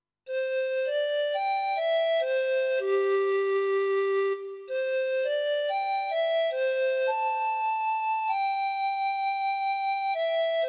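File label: Pan Flute, digital piano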